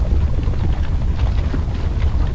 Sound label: lava flow